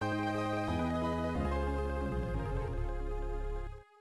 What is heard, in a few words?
Logo; Street Fighter Alpha 3; Zero 3; CP System II; arcade